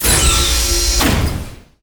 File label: Door Large Metal Open; SFX Kit; Doors